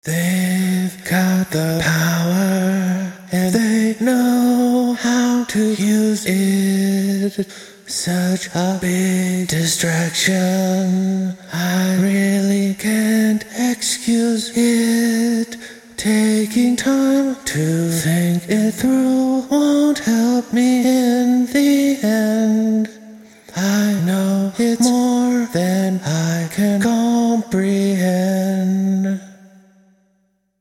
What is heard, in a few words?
A Cappella